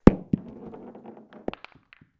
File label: sink